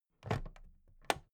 plastic clasp open regular

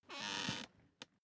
office chair creak lean back short

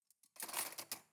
cutlery cake forks drawer place soft

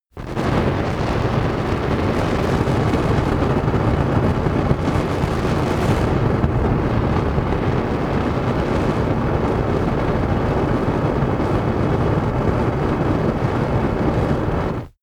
fire burst

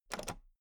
toolbox latch open heavy

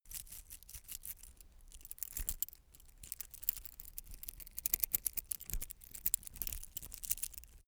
metal watchstrap rattle

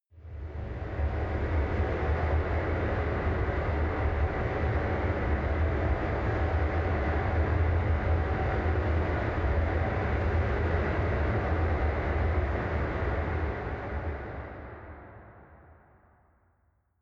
machine room

